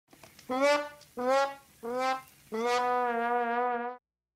trombone; pinkie

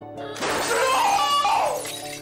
bottle breaking; wonder showzen

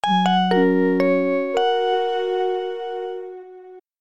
nokia 7210 startup

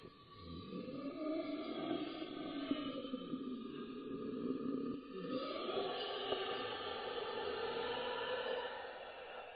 dragon roaring sound effect

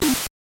monk punch

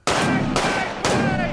kitchen gun bang

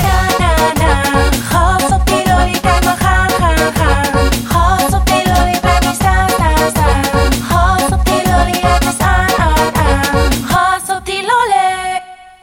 gass jingle

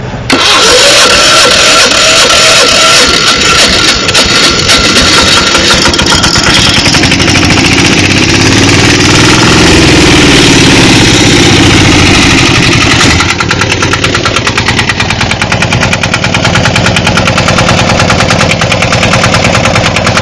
diesel motor starting

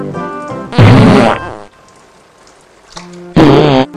farting

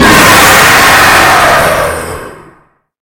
screamer; springgirl

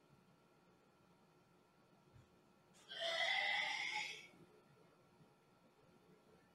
puke

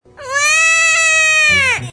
waaaaaaah; Caillou

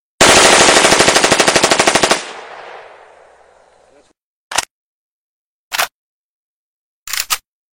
ump 45 sound effects

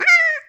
slime fear; slime rancher

